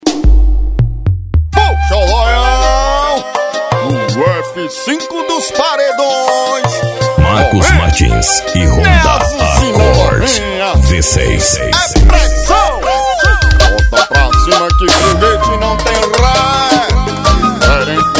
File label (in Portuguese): piseiro